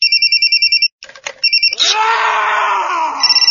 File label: crazy sms, ringtone